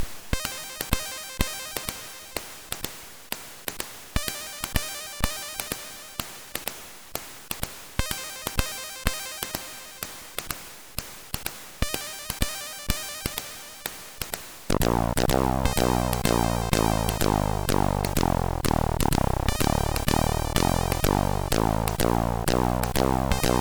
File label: Nightjazz, CSG8580R5; hvsc